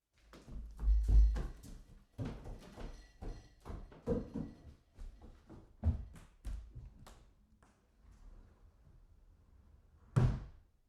shuffle around house

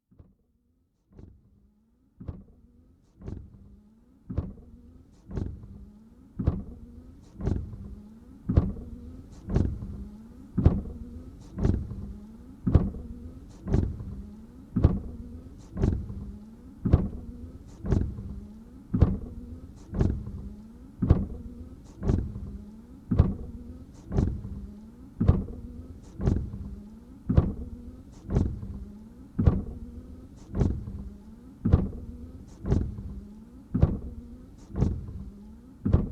windshield wipers no water

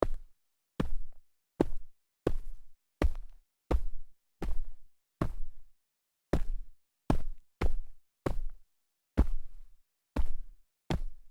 sneaker small solid jumps dirt

